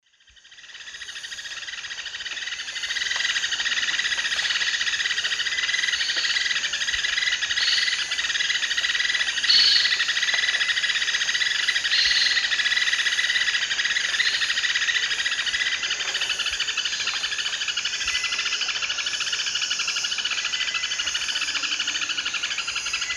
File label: Dendrocincla fuliginosa, rattle; S. America; Eastern Lowlands